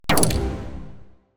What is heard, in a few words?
pinball bumper; marble race